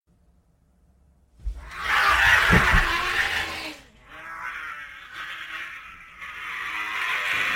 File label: screeching; pewdiepie